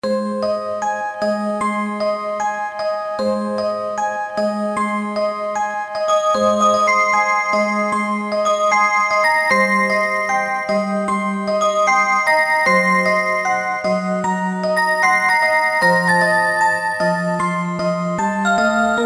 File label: rhythm song, clocks